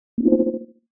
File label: Ui Confusion Blip Sytrus Arpegio